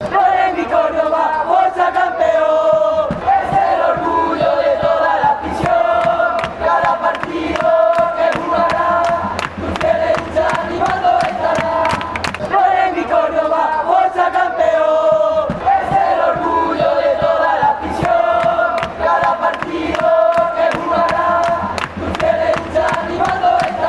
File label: orgullo de la aficion fanchants